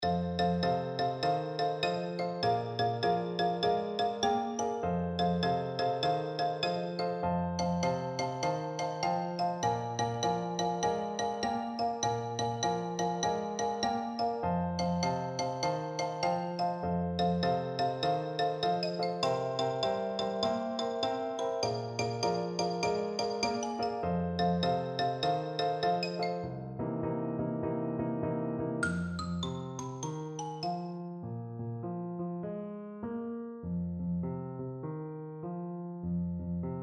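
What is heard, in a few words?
xylophone, percussion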